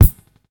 kick drum f sharp key; kick drum samples; one shots

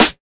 00s nice snare d sharp key; snare drum samples; one shots

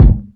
subby bass drum single shot G key; Mono

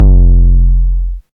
big kickdrum sample f key

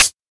muffled hat sample c key; hat samples

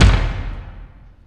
high floor tom one shot d sharp key; tom drum

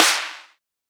huge clap one shot b key; single hits one shots

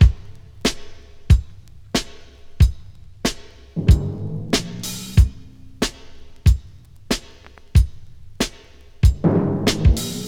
93 bpm drum beat B key; drum loops

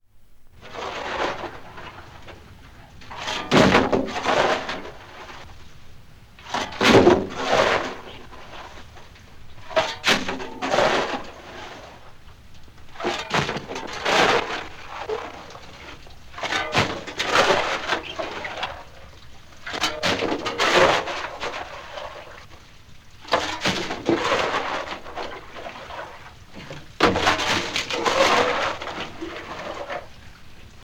shovelling in a coal mine

